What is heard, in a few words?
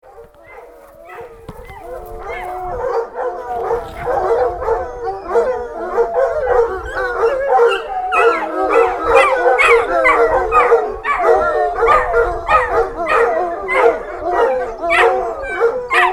Dogs; Camino